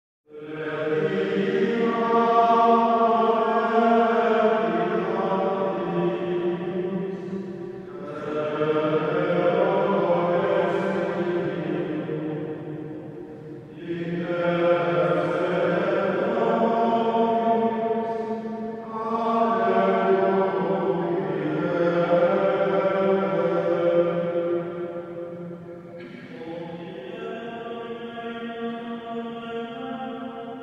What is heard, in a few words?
Monks Chanting Laudes; Hollywood Edge Historical Series; Traffic Free BG's & FX